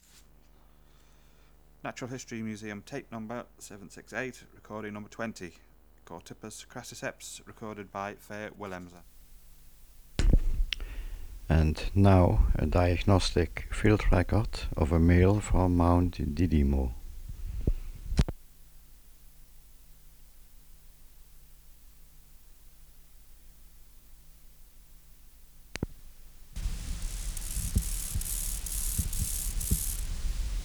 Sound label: Chorthippus crassiceps